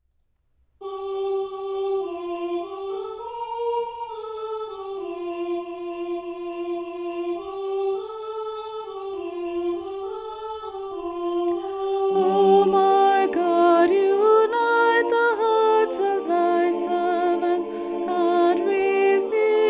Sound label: soprano